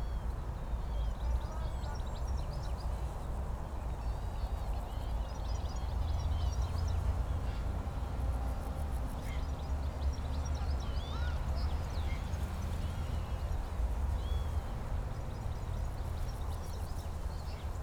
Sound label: ambience